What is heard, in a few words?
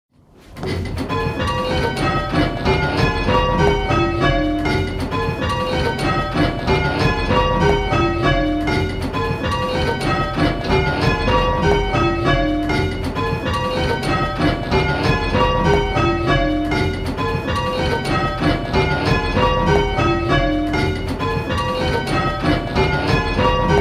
Striking 6-Bell Call Changes; 421356; Pebworth